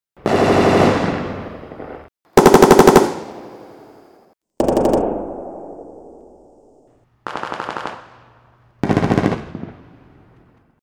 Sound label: Machine Gun